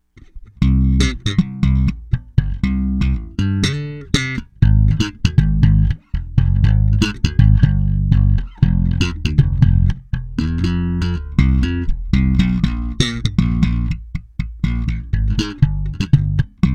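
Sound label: AFTER 120 SLAP EQ ACTIVE, ベース, Bartoliniプリアンプ; Bartoliniアクティブ・スラップ（HiLoブースト＆ミドルカット）; それぞれEQは20度ぐらいしか回していない; TAURAMベース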